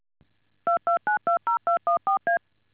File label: DTMF